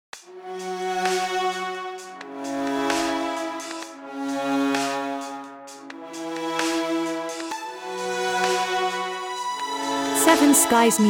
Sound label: Future Dubstep Symphony